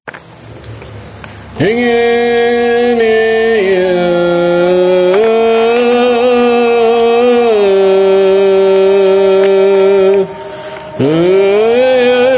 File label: singing; Arabie saoudite Bahrein Emirats Arabes Unis Koweit Oman Qatar Yemen